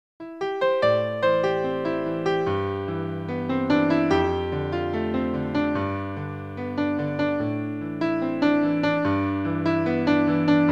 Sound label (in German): Vertraeumte Klaenge Pno